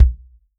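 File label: bass drum, Real Drum Kit; Best Service ProSamples vol.43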